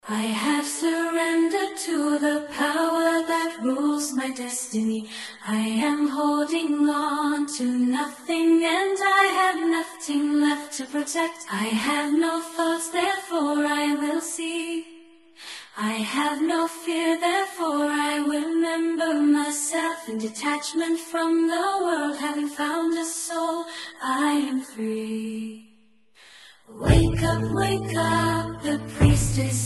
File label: Priestess song; Divine Chants